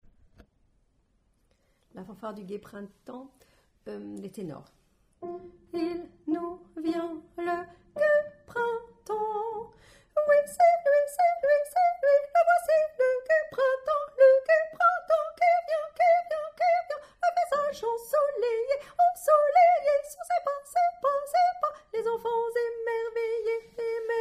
fanfare Tenor

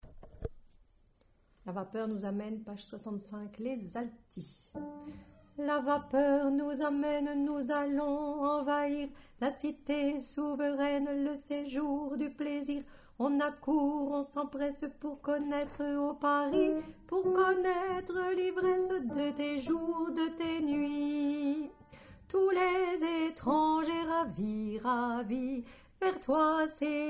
Alto

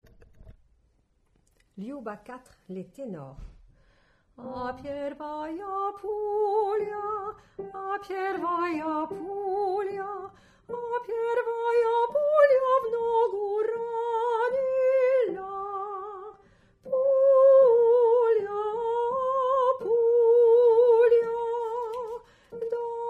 Tenor

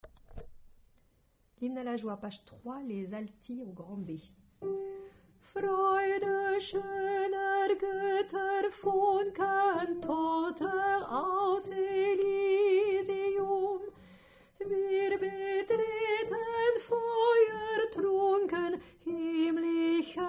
Alto

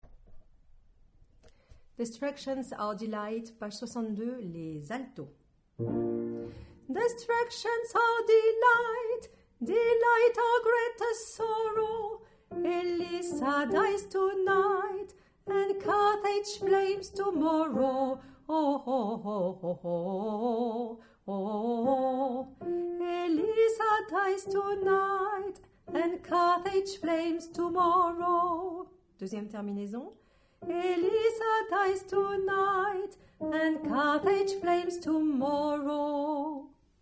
Alto

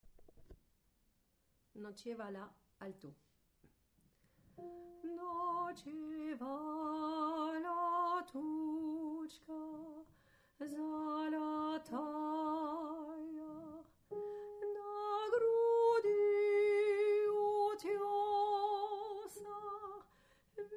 Alto; Ночевала тучка золотая

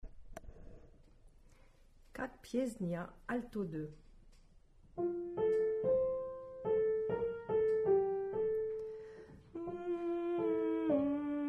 Alto

